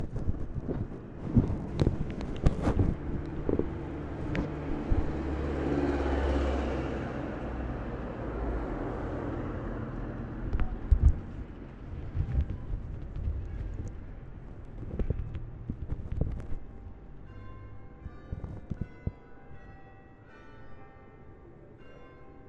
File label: newest clock in worcester striking twelve with other striking in background